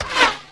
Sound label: arrow pass by; Mount&Blade Warband